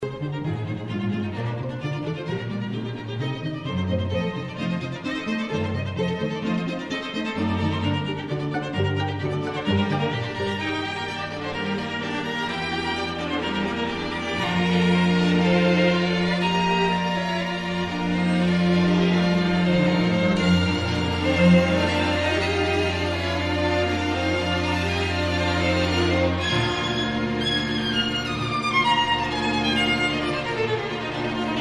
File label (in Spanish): octeto